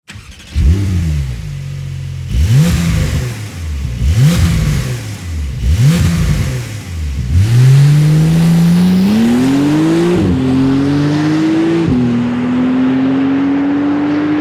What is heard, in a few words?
Porsche 911 Carrera S 450ch 992 MK1 Standard Sound Systeme